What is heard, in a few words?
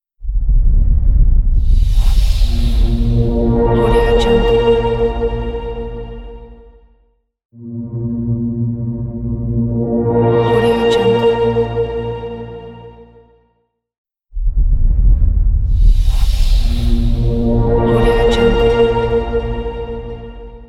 dark short logo